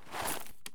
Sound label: item flakes foodtake; Escape from Tarkov